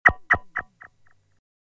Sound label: non tonal